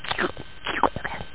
creature; drwho

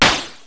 crunch; Enciclopedia Del Perro

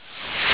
shwha; GameRes

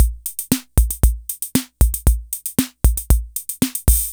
TR77 POP, Electro Crash; maudio

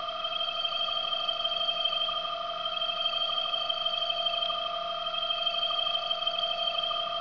laser; 12000 Graphics Pack - A Complete Graphics Collection For Your PC

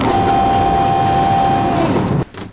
SLIDE; Graliens

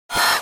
strange

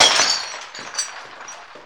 Broken

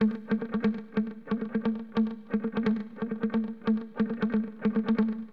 HipHop Guitar; LiveAct Pool 1

